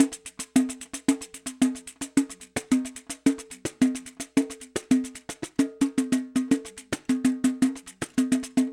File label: Pandeiro 4bar